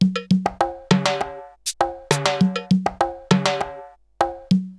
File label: RX17 Perc (100BPM), cyborg beats; musicradar